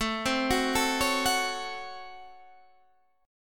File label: Db Major 7th Suspended 4th Sharp 5th A